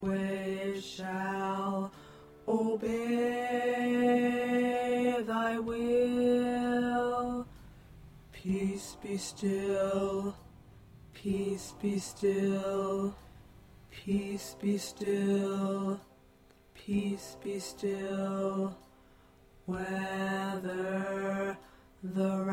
Tenor 2

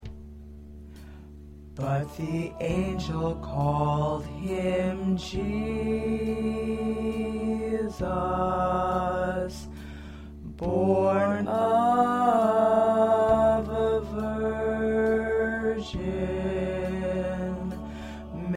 Tenor, choir parts

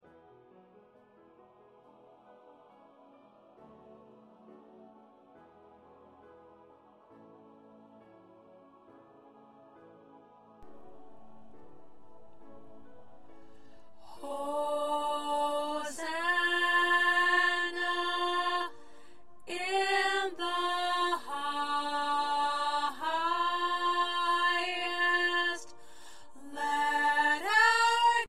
Choir 2 Alto

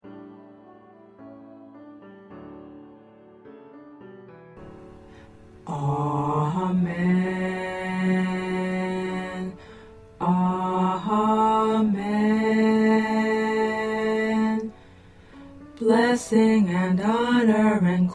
Amen Baritone

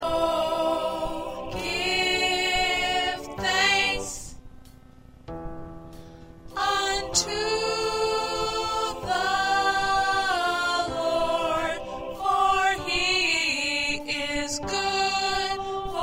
Soprano, choir parts

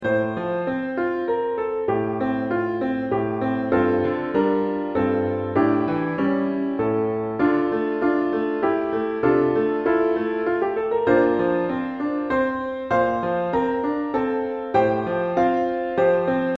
Piano

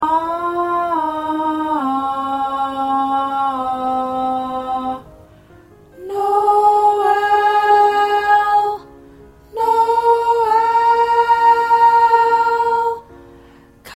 Alto

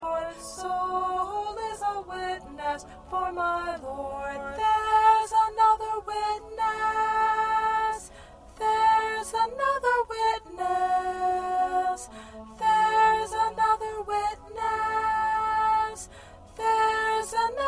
1st Alto

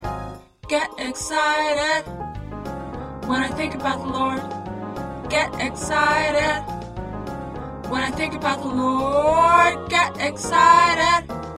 Tenor

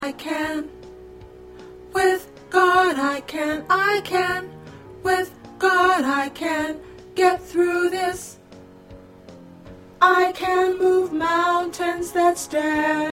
Tenor, gospel songs

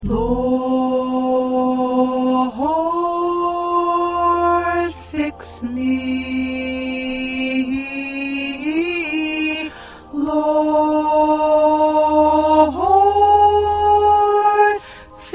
Alto, choir

